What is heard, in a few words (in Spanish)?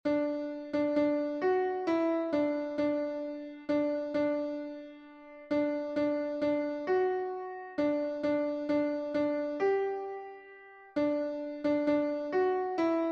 CONTRALTO, himnos en piano; cantos del camino